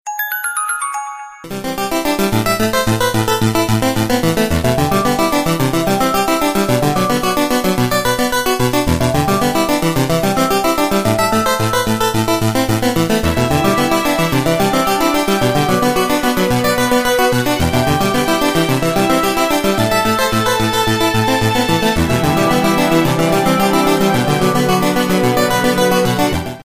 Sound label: ring tone